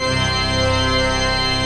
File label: PIPE ORGAN